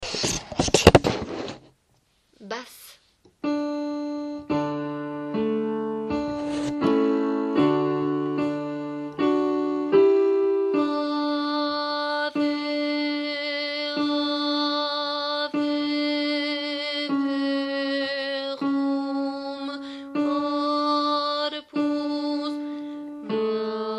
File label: ave verum, basses